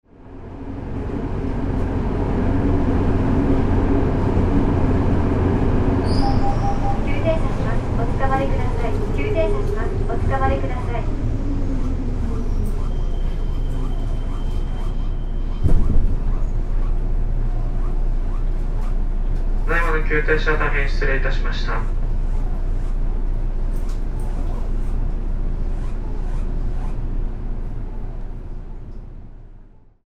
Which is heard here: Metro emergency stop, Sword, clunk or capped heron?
Metro emergency stop